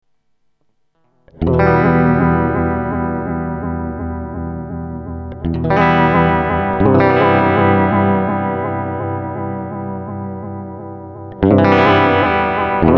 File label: vibrato fm